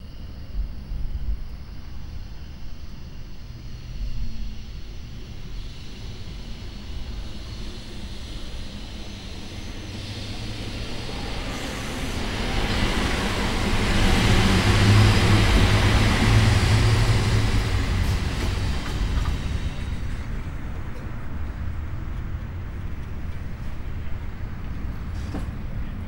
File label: Elect Bus Arrives, Electric Bus